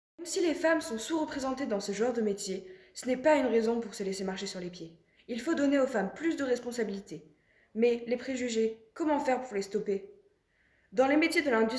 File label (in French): slam